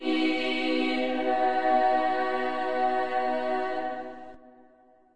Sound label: Slavic Choir B Eeraye, Slavic Maybe Bulgarian Choir; VOCAL